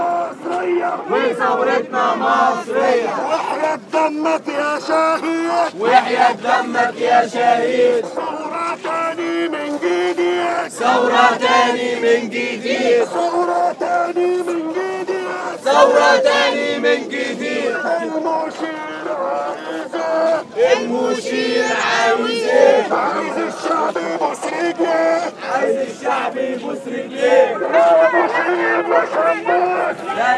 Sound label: more sights and sounds from tahrir right now